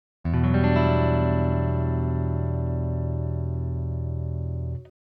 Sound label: em7add9; fretjam